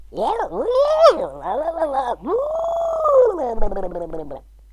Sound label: oohooohoohblublebleble